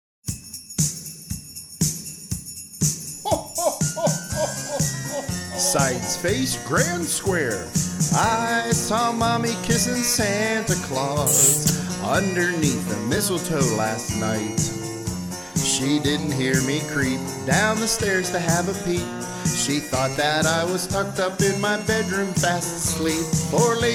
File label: vocal with sound effects